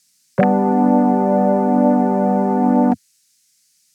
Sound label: Organ; j106